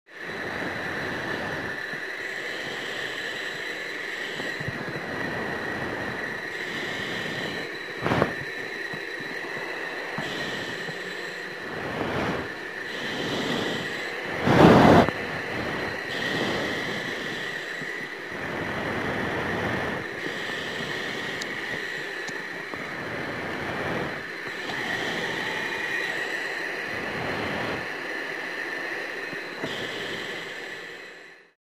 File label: Breaths Relaxed; breathing